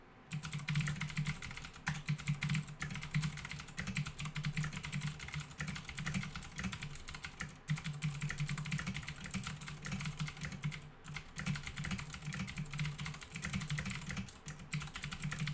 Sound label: cherry mx blue